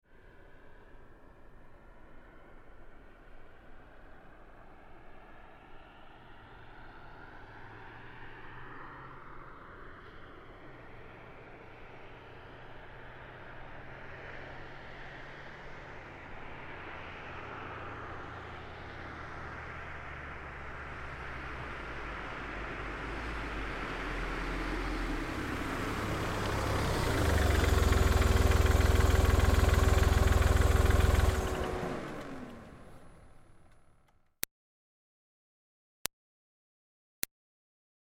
Car Smart Car Up Stop Med; 2005 Smartcar Fortwo; Vehicles; Big Room Sound

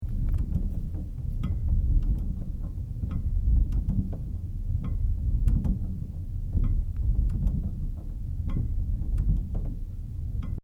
PLAYGROUND ROUNDABOUT ROTATING MEDIUM LOOP; Various playgorund equipment; Playgrounds

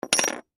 Coin Drop Single; Money; Foley; Pirate Gold; Objects; Blastwave

